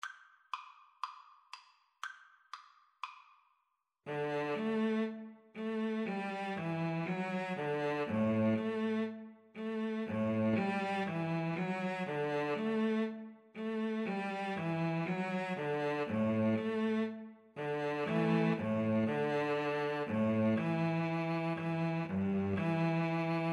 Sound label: Violin Cello Duet; Brahms, Johannes; Finale from Symphony No. 1 (Theme)